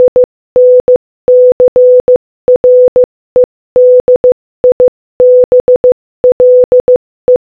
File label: INCREDIBLE Morse Code Audio